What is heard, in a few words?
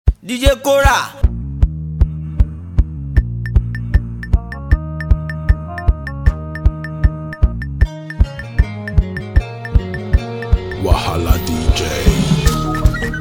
Ojapiano